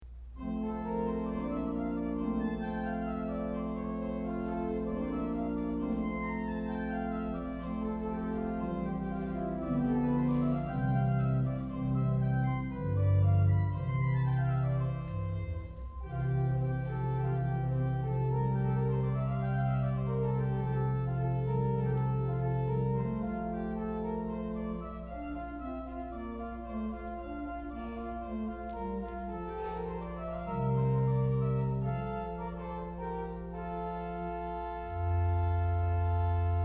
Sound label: Variations to the Sicilian Hymn, organ